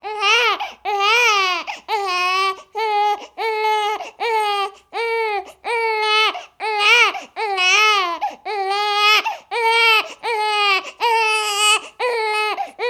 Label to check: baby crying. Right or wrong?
Right